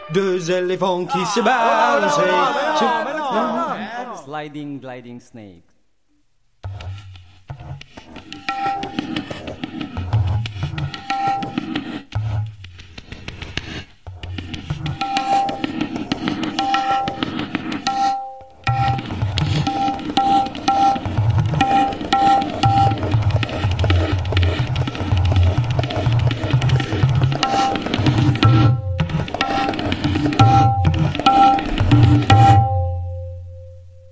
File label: Snake Tablas